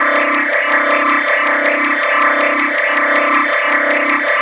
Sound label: School Alert